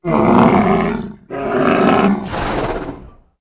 Call, Dino; Toro

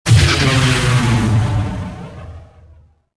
ion cannon

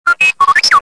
talk, r2d2

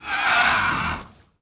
skeleton dead; voices; Pirates Of The Caribbean

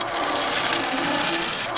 gate scrape metal LOOP; Cars; XBOX

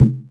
bouncy car tire hit; bouncy objects; Tony Hawk's Project 8; XBOX

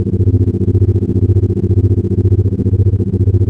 bridge, ambience; doomgiver

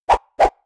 Knife Attack; Weapons

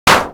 metal hit, bullet hit; projectiles; Max Payne

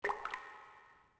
drop, CAVE; AMBIENT; Pirates Of The Caribbean

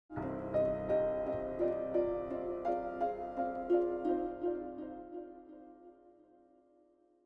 MUSIC harp; Myst III Exile

